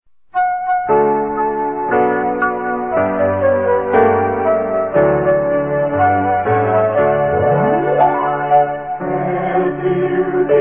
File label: hymn